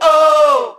"oh" SUNG, Voice; ooh ahh

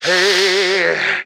hey SUNG

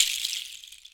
Shaker

